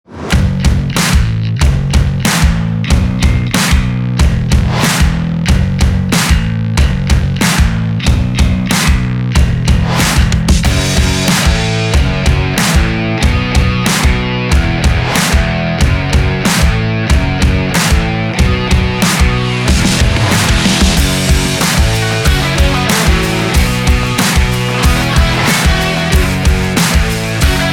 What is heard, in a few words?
Sport Music